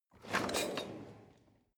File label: drawer cutlery draw in